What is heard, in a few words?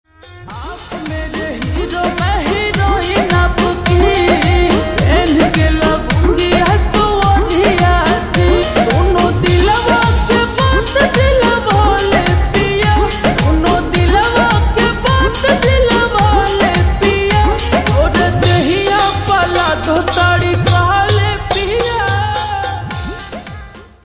Slowed Reverb Ringtone